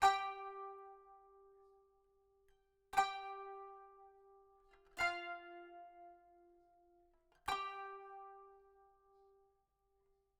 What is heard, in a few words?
kanun